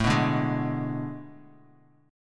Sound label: A Major 7th Suspended 2nd Suspended 4th, Guitar; AM7sus2sus4 strummed; 4,2,2,1,x,x; JGuitar